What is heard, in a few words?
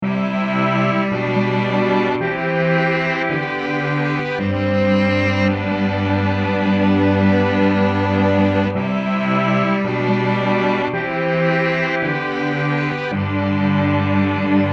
Super Cello Section; gforce software